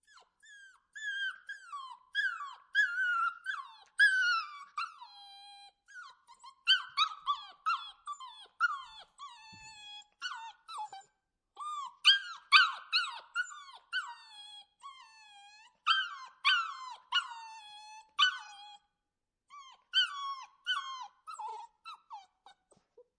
Puppy Whimpering, Animal, Puppy Whimpering, Crying, Three Month Old Labbe Female Puppy Dog